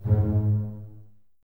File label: BSS G#2 Pizz, String Ensembles Basses; Miroslav Vitous